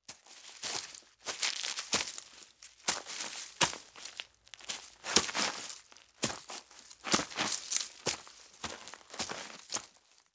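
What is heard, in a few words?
Footsteps Loud Leaves Grass Crunch; Fairlight Sound Lib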